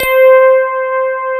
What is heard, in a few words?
FM ELP 2 C4 L, Keyboards Old School; USB Soundscan vol.09; AKAI